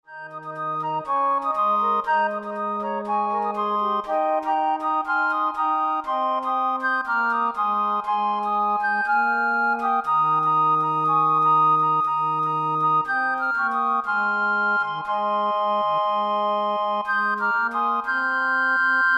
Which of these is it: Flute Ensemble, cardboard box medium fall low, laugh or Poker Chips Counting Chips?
Flute Ensemble